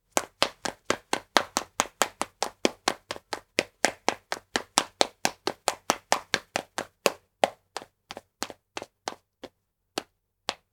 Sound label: step; 固い床を猛烈ダッシュ、から徐々に速度を緩める（音楽？ノイズ入り）